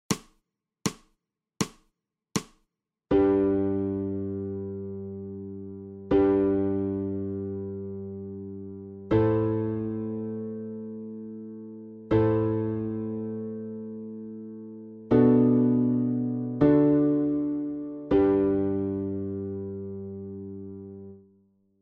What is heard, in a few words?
SOPRANO